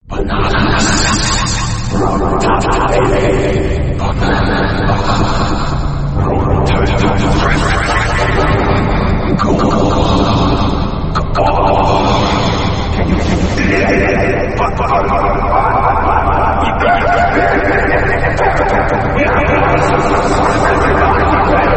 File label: banana laser gun; dani